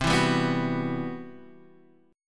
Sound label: C Major Sharp 11th; CM#11 strummed; JGuitar